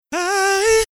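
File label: Future Bass melody vocal chop; Sozai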